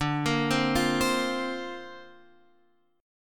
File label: Bb Suspended 2nd D, chord; Bbsus2/D arpeggiated